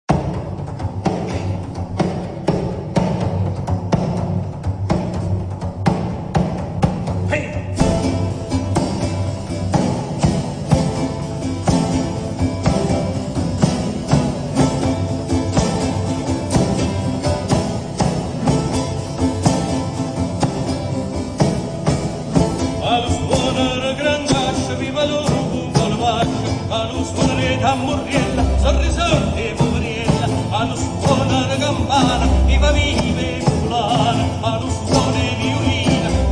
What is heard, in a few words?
strade